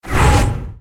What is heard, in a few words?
Whoosh Out